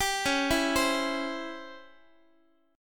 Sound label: C sharp Minor Major 7th Flat 5th Guitar; C#mM7b5 arpeggiated; x,x,10,11,8,11; JGuitar